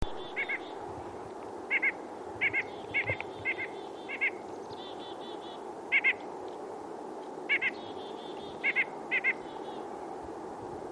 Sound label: nuthatch